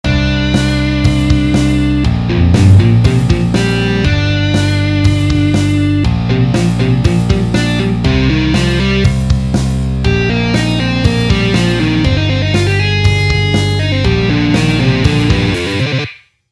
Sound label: guitar solo example